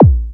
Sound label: BD, Drums Dance & Groove; USB Soundscan vol.11; AKAI